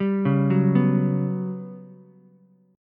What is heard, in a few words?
G Major 7th Flat 5th, Ukulele; GM7b5 arpeggiated